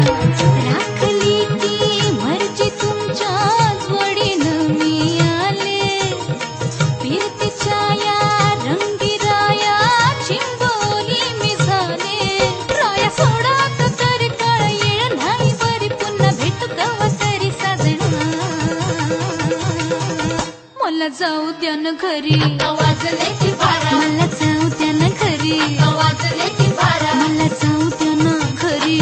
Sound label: Best Marathi Song